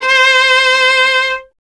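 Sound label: STR VLA C2, strings viola; Miroslav Vitous; Solo Instruments VOL-1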